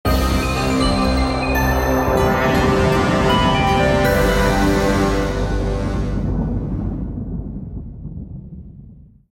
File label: Ice Storm (End); bejeweled classic